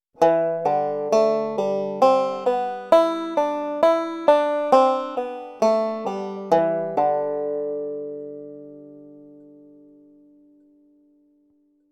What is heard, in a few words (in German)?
pull off saitenklettern